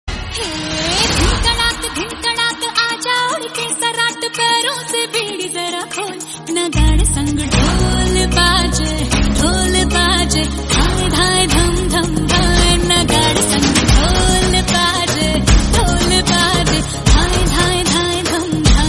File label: bollywood songs music tone ringtone